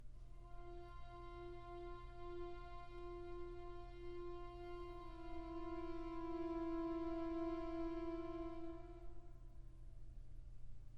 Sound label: Concerto for Trumpet and String Orchestra; Mvt. 2. All that's beautiful drifts away